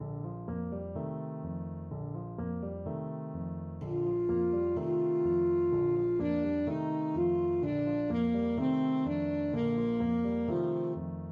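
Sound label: berceuse alto saxophone; faure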